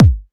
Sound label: kick machine